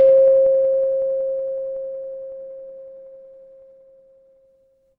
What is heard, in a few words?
instrument singing bowl